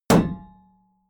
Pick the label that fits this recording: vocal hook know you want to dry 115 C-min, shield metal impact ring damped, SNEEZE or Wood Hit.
shield metal impact ring damped